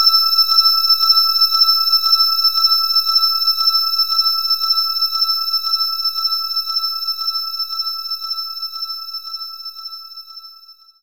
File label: Synth Bell; knights try